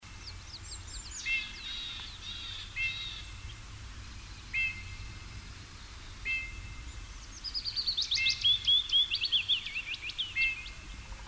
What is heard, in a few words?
Pyrpyr; 14 June 2002 near Syktyvkar; Linnut